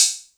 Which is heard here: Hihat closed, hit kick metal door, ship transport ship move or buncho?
Hihat closed